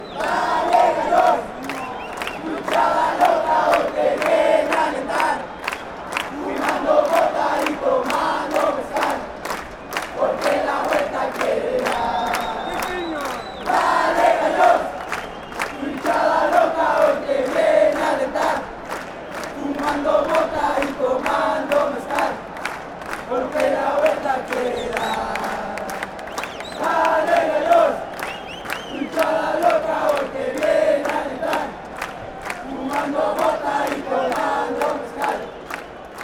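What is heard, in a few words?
fanchants, football chants; dale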